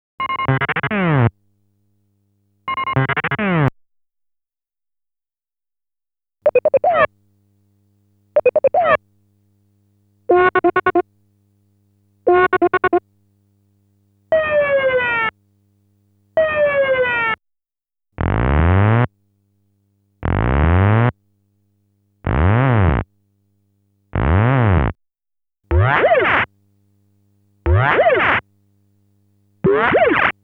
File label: Guard Robot, SFX; GITS; Stand Alone Complex